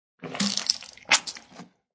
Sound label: Spitting Liquid; Spitting (Sound Effects:Human)